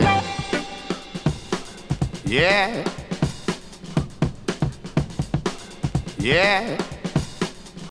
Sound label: 122 bpm fresh drum beat g key, Free drum loop sample - kick tuned to the G note